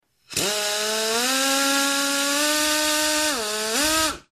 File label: Air Drill Straining